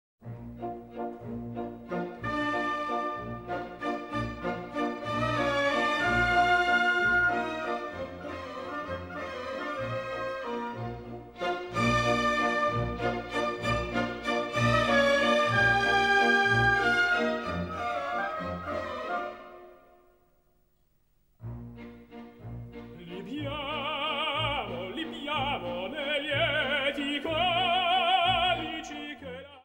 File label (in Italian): classica